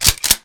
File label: cock